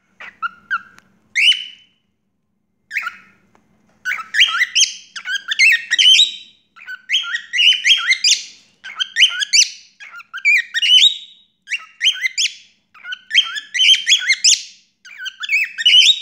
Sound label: The voice of a female Dutch bride, chicken